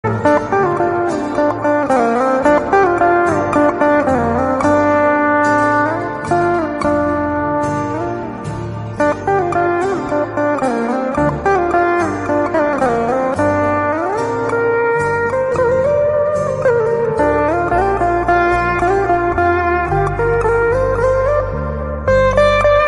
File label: Ringtone Instrumental